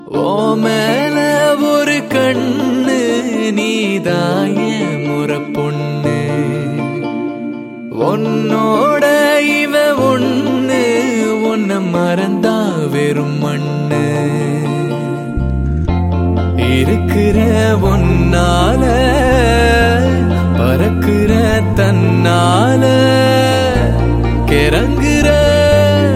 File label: Male Version Ringtone